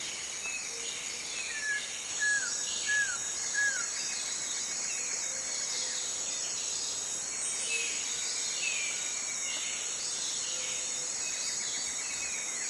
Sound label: tropical rainforest morning sheltered fresh woods under the canopy; sonniss gdc